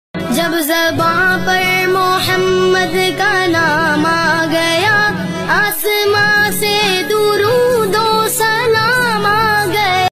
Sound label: islamic ringtone new gazal gazal ringtone naat ringtone